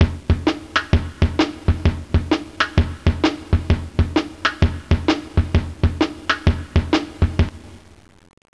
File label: Eight Bit Kit, Drums